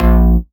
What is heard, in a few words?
Bass Shot A#; Mutekki Media; Vengeance Electroshock Vol.2; Bass Shots